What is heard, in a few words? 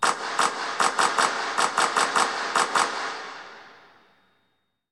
Hockey Organ Claps